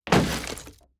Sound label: environment zone barricade break